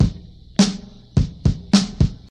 105 bpm modern breakbeat a sharp key, Free drum groove - kick tuned to the A# note